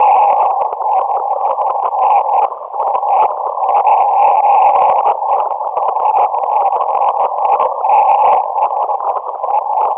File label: 18300 Hz MSK